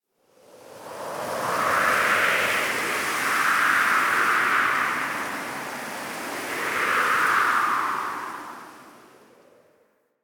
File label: Camp Wind Gust B; Warframe; Quests; TheNewWar; Ambience; Drifter Camp